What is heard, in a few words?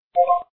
tap to play; traffic command